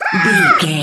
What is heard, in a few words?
goblin fairy attack low